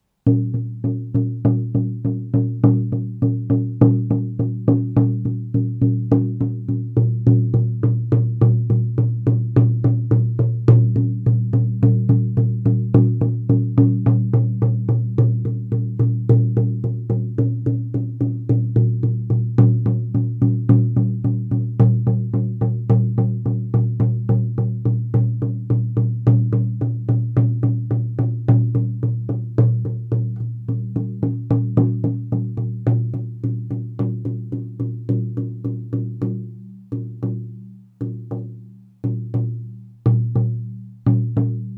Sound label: Red deer Fierce Heart, drum